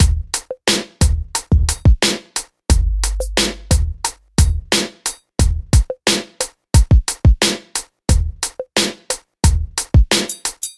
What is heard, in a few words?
Jamaican Hip Hop